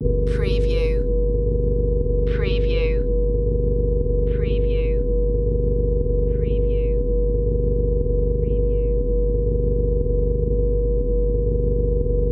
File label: High n Bassy